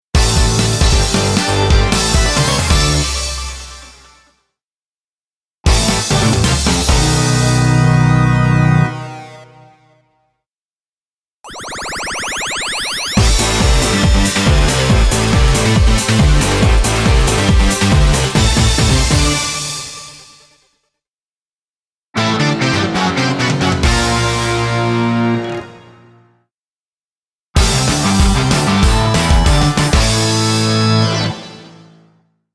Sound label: Jingle Collection